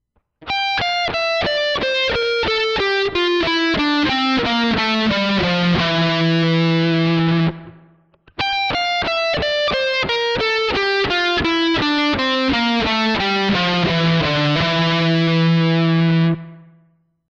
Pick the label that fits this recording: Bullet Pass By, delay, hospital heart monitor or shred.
shred